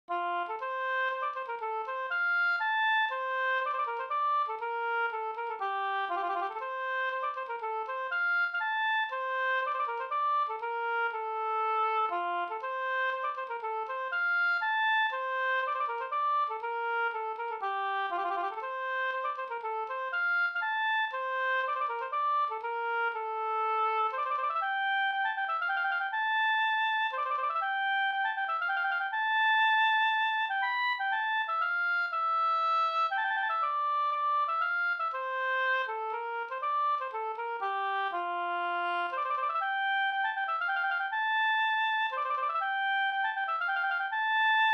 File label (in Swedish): polska Bingsjopolska Vispolskan